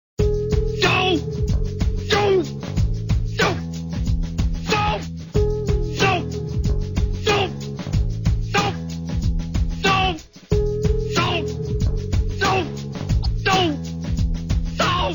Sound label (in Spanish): Gritos; Homero